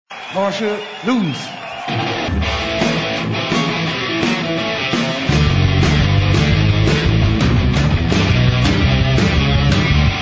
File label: Blues